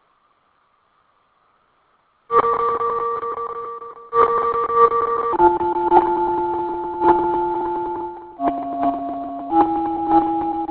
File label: drip